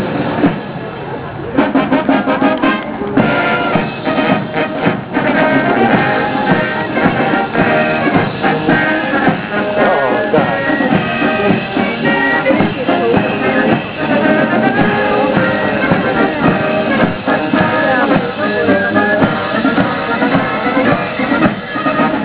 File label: band, this grand display of pomp and circumstance takes place daily in front of Buckingham Palace; London